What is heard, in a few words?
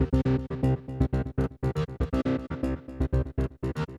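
Ambienza Bass Line 120 C minor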